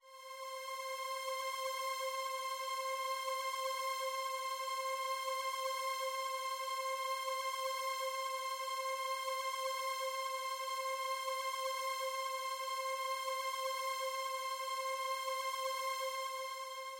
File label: strings